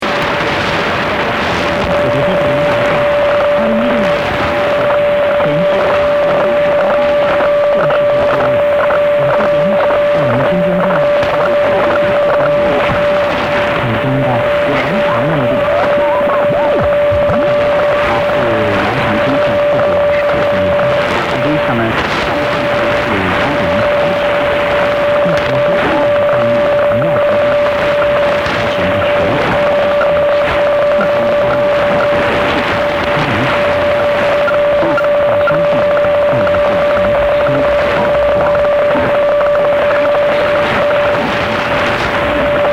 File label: 9460 khz